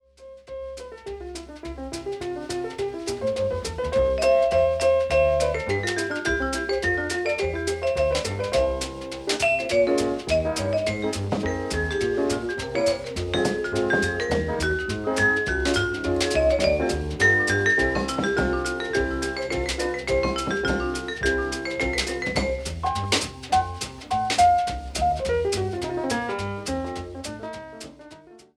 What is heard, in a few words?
Quartet